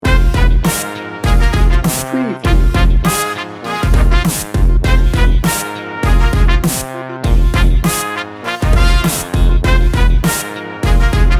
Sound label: new orleans street party